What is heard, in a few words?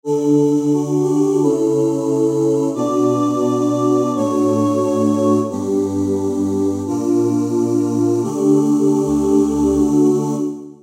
Pop Oohs, E-mu Proteus VX